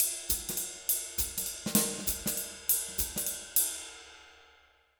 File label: Jazz Latin Drumloops; USB Soundscan vol.08; AKAI